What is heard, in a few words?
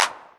Clap, Novation Drumstation